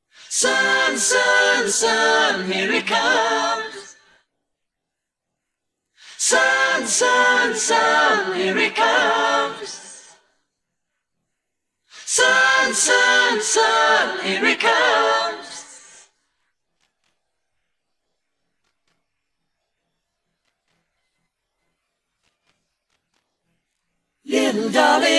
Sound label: vocals